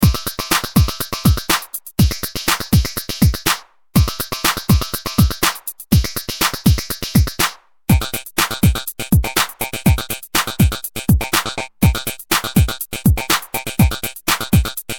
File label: Pitch